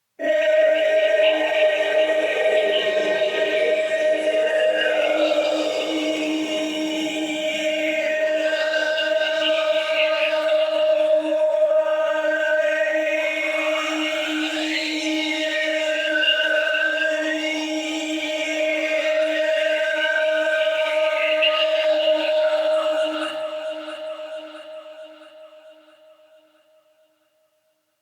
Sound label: Choir; Warframe; Sentients